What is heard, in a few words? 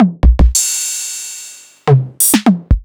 DMX606